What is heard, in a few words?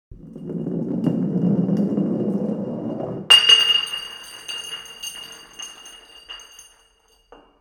Roll And Fall Of A Glass